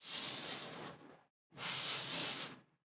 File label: grooming soft